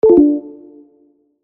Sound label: error